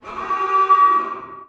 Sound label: dropoff alarm, mono; dokobots